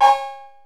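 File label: 808 cowbell; Wizoo; Platinum 24 Electronic; 480L BW 808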